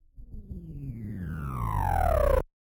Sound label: Rotor Charge Down; SoundsCrate